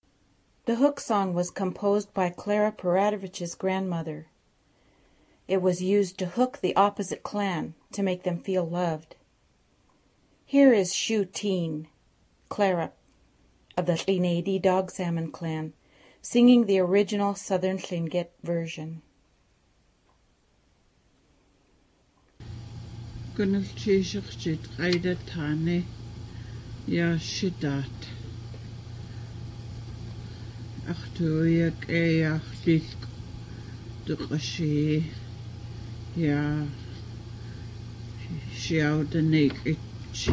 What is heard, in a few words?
hook song